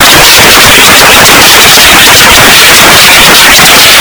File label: jumpscare very loud; cantaloupe